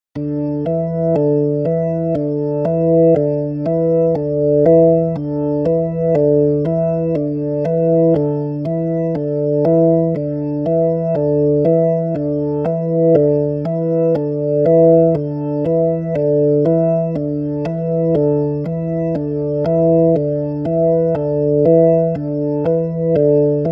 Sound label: Organ